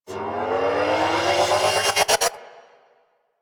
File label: Cart Turret Energy Ball Charge Up; Warframe; Venus; Venus Turrets; Venus Cart Turret Energy Ball Agent